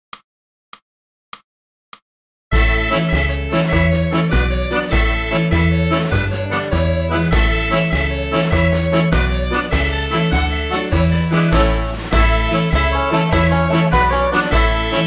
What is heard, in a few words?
backing track